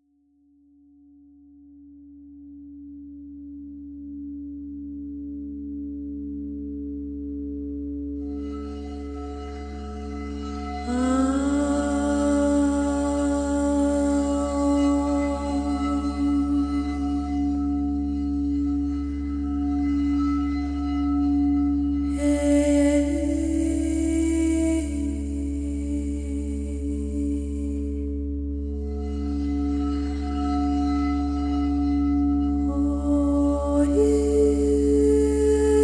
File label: Crystal Bowls 295Hz 213Hz with Vocals